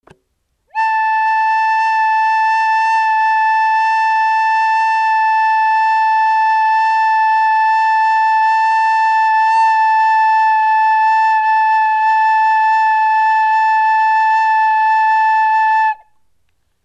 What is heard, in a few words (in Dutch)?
blokfluit; meting blokfluit